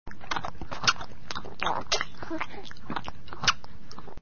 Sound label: drinking; baby; Little Bill; Nick Jr. Take Care of Baby